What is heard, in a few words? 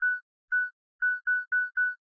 selfie 2sec timer; Samsung; Galaxy S8; SamsungCamera7